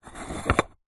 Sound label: household ceramic bowl square twist rotate stone surface